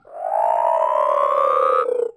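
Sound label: space frog; ambients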